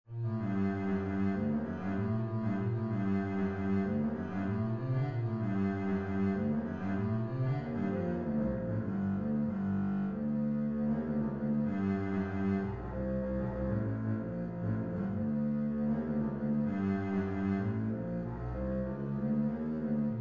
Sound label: nahawand bass, string bass